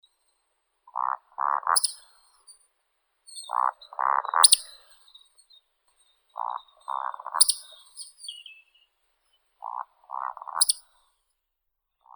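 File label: woodcock, Scolopax rusticola; valdshnep; zvuki prirodi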